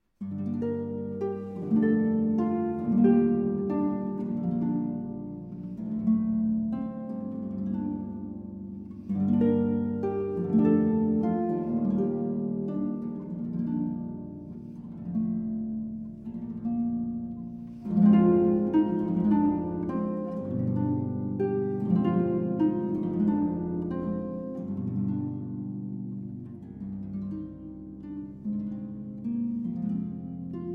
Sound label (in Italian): Sarabanda e Toccata per Arpa I. Sarabanda